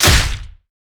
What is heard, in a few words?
basicattack dragon; LoL; shyvana